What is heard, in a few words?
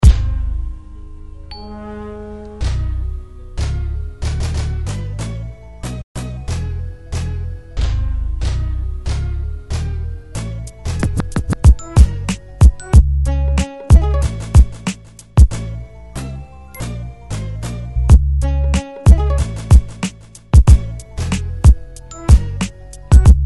Beats